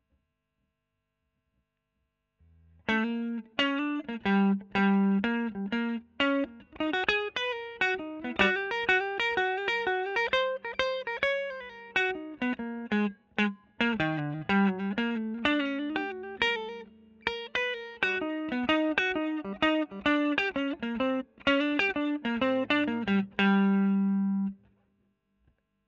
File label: E.GTR Royer Solo